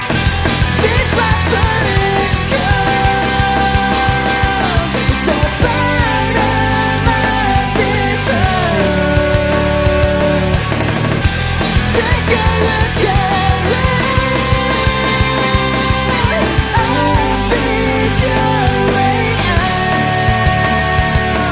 Broken Melody

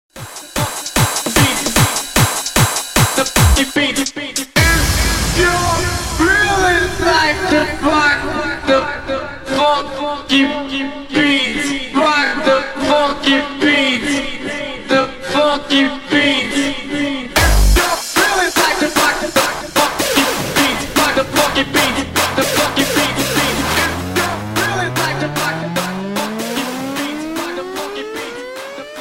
Funky beat

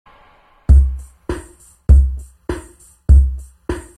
beat

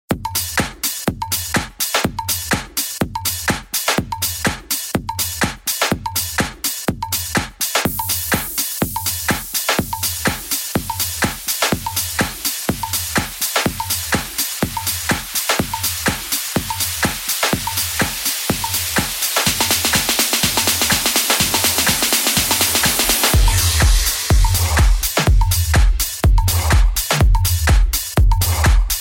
beat intro

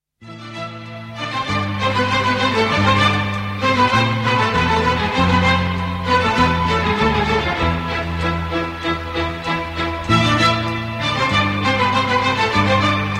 Turkish Arabesk

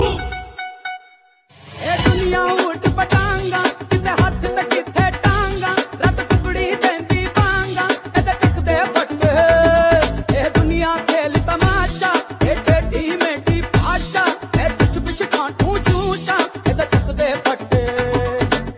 punjabi

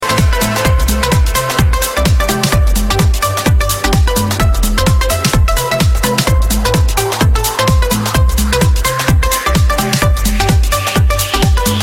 melodi remix

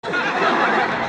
rare laugh

